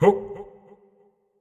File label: Effort Tiny; Going Under; Marv